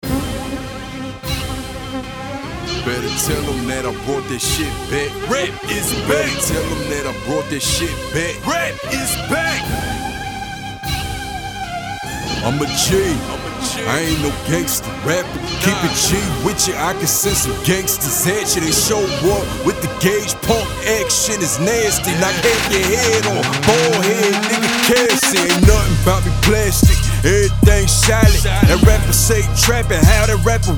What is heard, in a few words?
Hip Hop Mix Mastered Sample